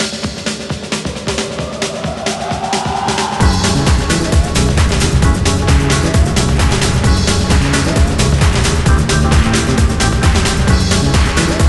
Victory; NCAA March Madness 07; Xbox 360

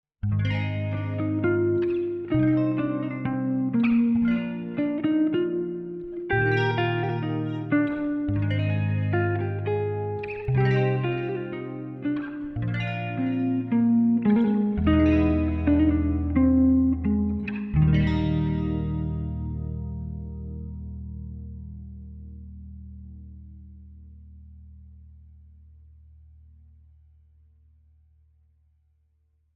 music ending

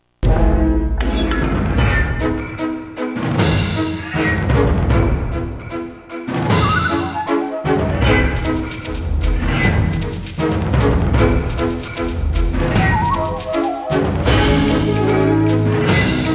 Battle; Xena Warrior Princess; PSX

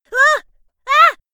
fall; DerekMorgan

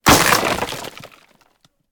Bone Destroy; Angry Birds

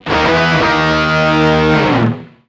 BGM Mission fail; deer drive; nds

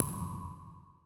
Button Press; Act It Out A Game of Charades; PS4; Wii U

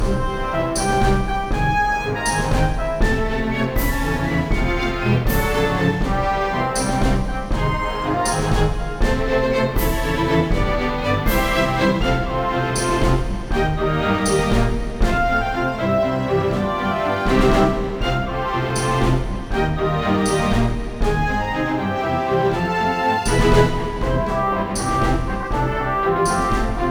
Boss Duel Music Good; sid meier s pirates 2004 pc

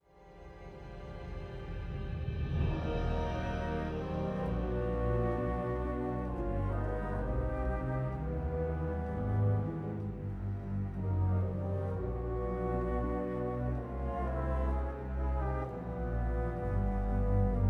Loading Loop; Sullust; Star Wars Battlefront

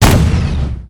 SNIPER ATTACK

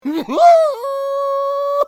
Pig laugh; Bad Piggies

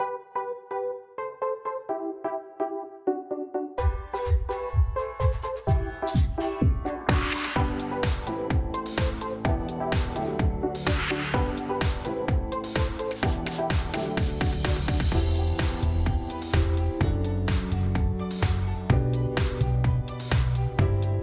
Karaoke House; The Sims 4 City Living; macOS; PS4; Windows; Xbox One; Xbox Series X/S

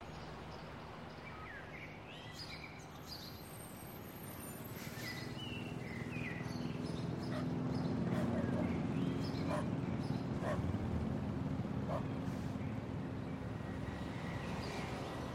Ambient - Tranquility; Transformers The Game

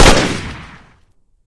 Machinegun Fire; Pain Train

